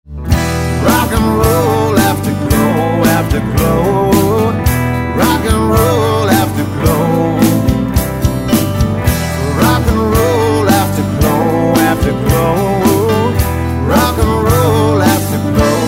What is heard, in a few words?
ringtone